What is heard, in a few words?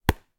Ball, Hit